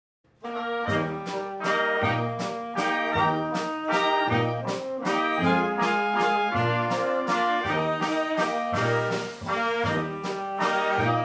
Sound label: Oompah Band Waltz Medley; Boston